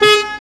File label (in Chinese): Horn RAW, 汽车